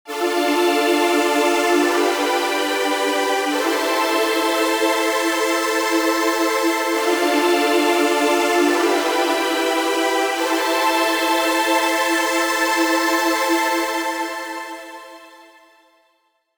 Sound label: pad; Ableton Live; Creative Extensions